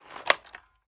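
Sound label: sniper reload empty fast up; gmod; iw8; xmike109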